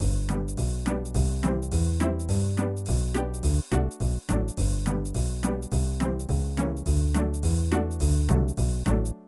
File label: Soundtrack